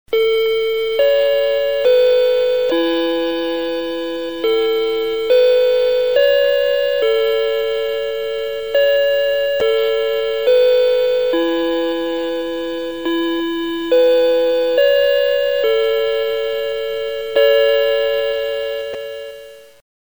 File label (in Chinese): 起床钟声; 学校常用铃声